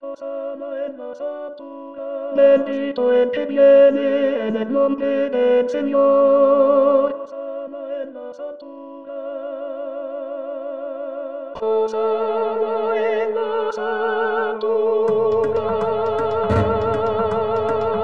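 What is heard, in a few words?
tenor; Misa Criolla; Sanctus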